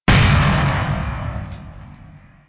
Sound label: space bomb explosion sound; angry birds space